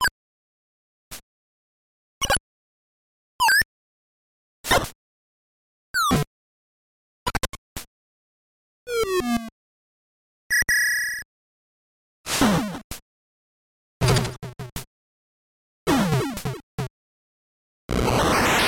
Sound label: sound effects; the flintstones the surprise at dinosaur peak